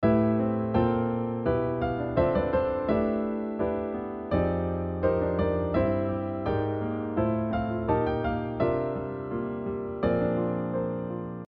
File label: harm mel